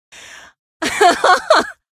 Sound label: laugh; episodic; episode 1; Source engine